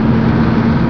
truck fast; SpecOps2 MPlayer Demo

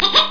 hip hip; F1 Licenseware - Volume 1

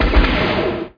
miss; AC Demo

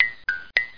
blink; Pyre

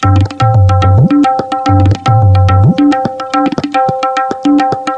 RHYTH, LOOPS

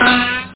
kil monst

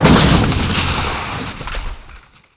COLLIS, Prangs

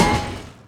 collision; Cross Racing Championship

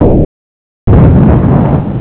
bloop boom; TacOps

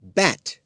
Bat

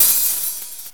Hi-Hat Open; TG300B; Drums; GMPlay

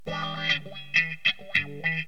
FUNK; Rythmes et Intros 4

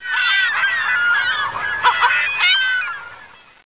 GULLS; Renaissance

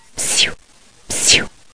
psiu, Brazilian Body language: Attention-Getters; Come Here; Also accompanied by the words "psiu, psiu"

